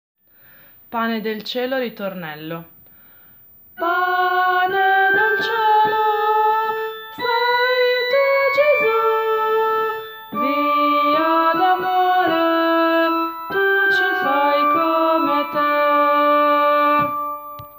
Pane del cielo ritornello

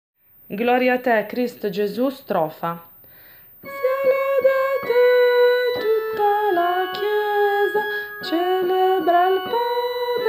strofa uomini e donne a strofe alterne

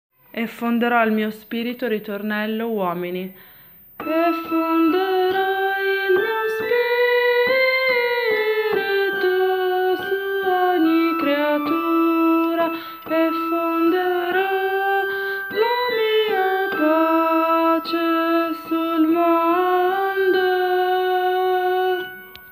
ritornello uomini

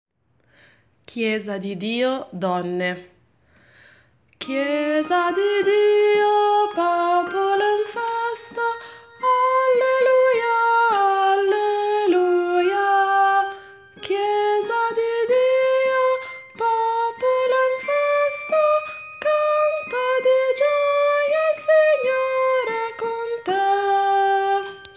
donne; Chiesa di Dio

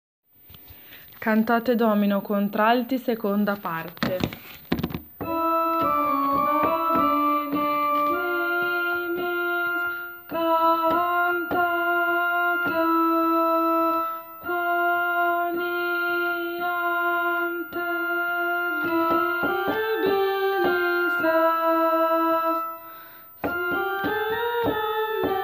contralti; Cantate Domino

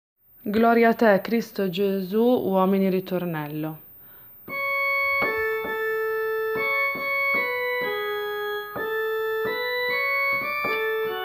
ritornello uomini

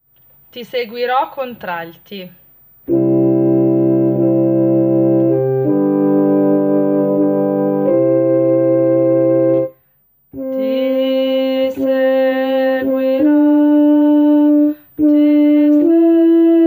contralti

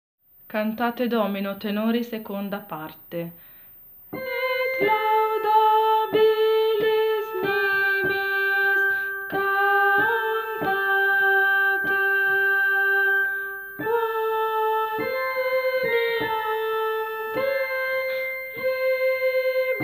tenori; Cantate Domino

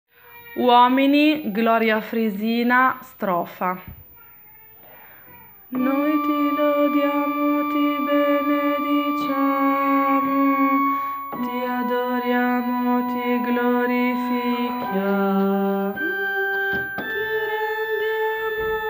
Gloria strofa uomini

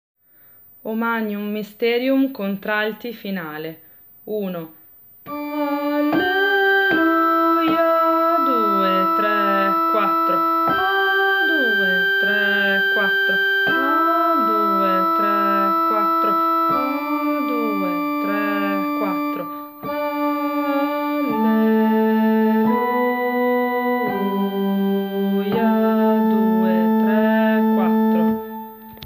O Magnum Mysterium contralti

